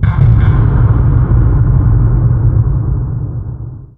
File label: mysterious perc, halloween ambient; Garry's Mod; tf2